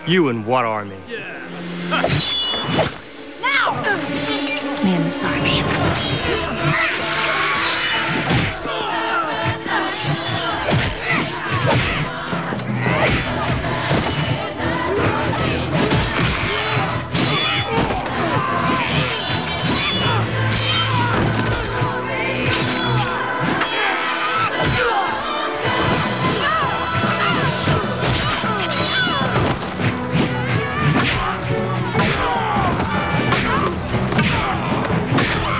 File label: battle